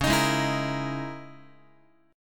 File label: Db Minor 6th Add 9th Db down Guitar; Dbm6add9 strummed; JGuitar